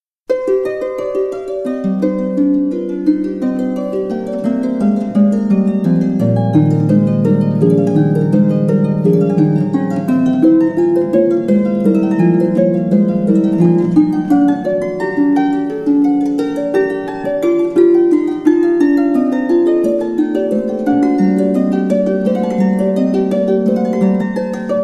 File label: toccata